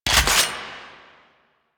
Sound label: Finisher Shotgun Pump; Symbilyst; Warframe; Quests; The New War; Kahl; Weapons